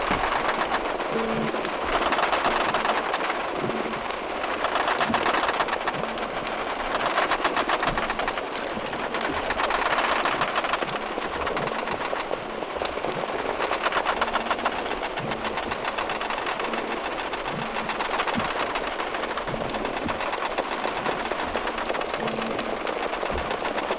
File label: silver perch chorus, fish sounds